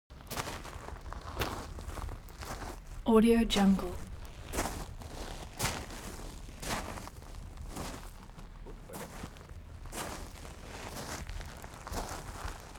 step gravel walk; foley; smartsoundfx

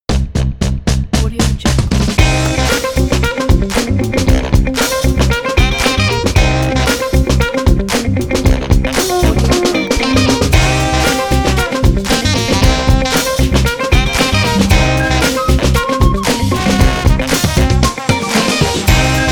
catchy upbeat funk logo

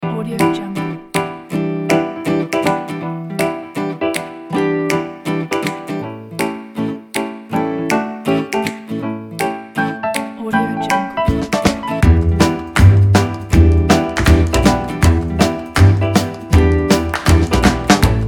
happy jazzman

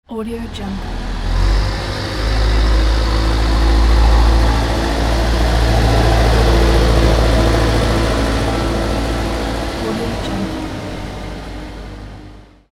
construction vehicle

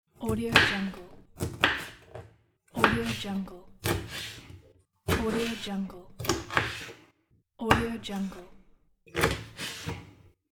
knife cuts on the cutting board

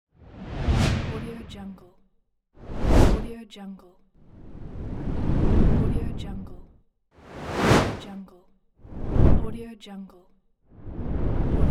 swoosh transition

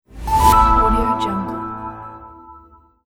short modern logo

